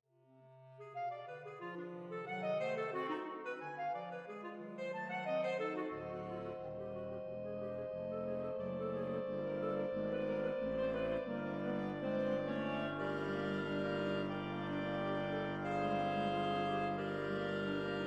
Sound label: Chorale for Clarinet Choir; Woodwinds; Franck; Chorale No. 3